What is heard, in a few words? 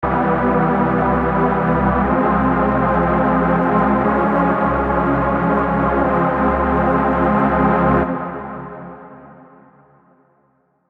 Double Harmonic Prog; Double Harmonic Advanced Scales MIDI; Unison Double Harmonic Advanced Scales MIDI Collection